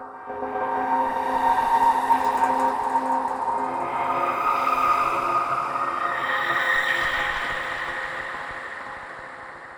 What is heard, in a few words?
pad, dark ambient